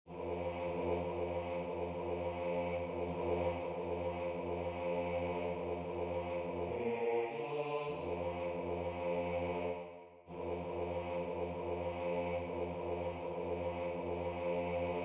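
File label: Bass, Religious Folk Songs Of the Negro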